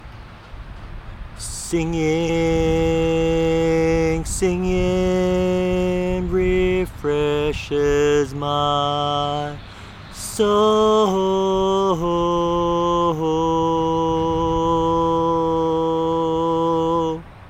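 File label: Baritone, barbershop tags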